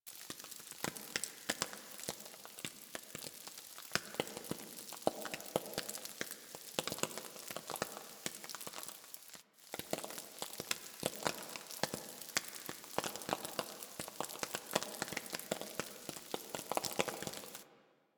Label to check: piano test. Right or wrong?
Wrong